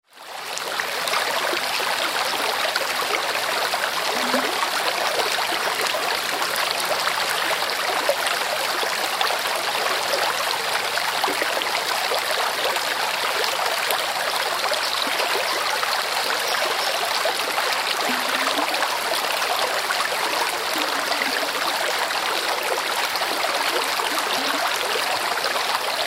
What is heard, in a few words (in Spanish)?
agua río; ruidos